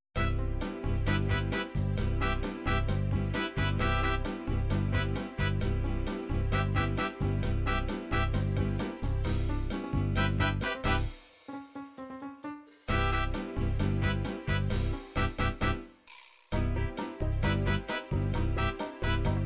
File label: cha cha cha cha